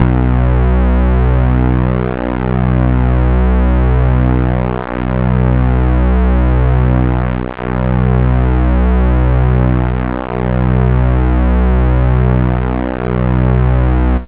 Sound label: P600 Lead E2